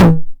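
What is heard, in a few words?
909 mid tom tape saturation; Toms; Goldbaby Tape808 & Tape909; The Tape 909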